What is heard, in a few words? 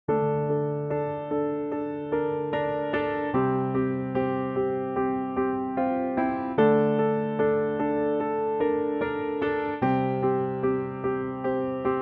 piano F; key - F - range - D to A; piano only arrangement